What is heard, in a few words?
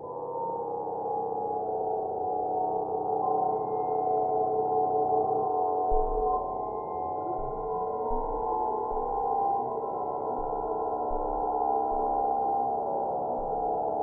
glow atmospheric background